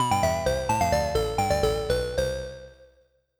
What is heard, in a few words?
ringtone melody